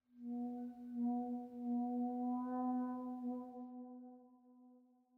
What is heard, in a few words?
Scary Scratchy; The Last of Us